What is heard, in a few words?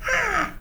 Cat